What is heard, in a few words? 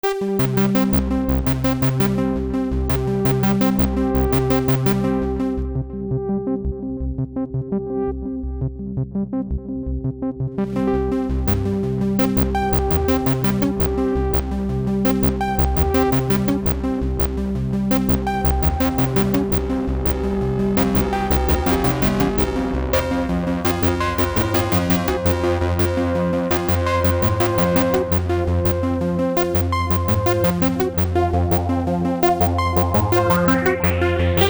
Bass; Rhino; Old School TD-style bass; one preset with all 6 sliders performed live